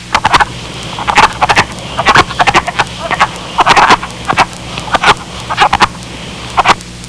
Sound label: wood, frogs